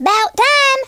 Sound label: laugh; Blues Man